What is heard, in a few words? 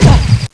bump; quake2; link